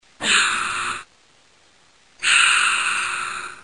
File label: tortoise; animal sounds